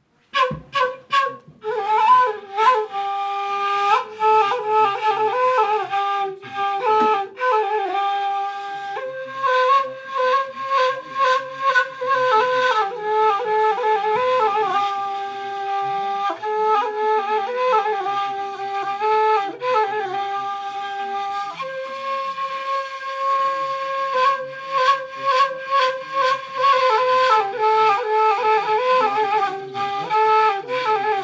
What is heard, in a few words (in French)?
air trad tunisien, flutes; rencontres flutes